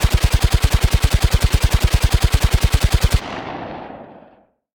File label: combat atmosphere; World of Tanks